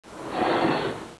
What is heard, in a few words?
paper door